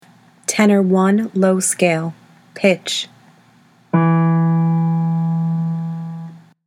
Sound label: tenor scales low, choir; all-state choir